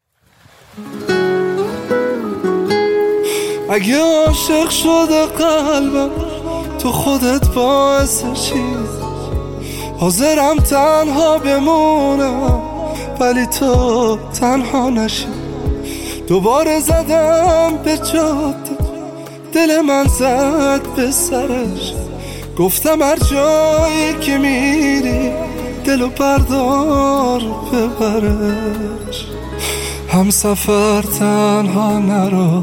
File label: (Guitar Version)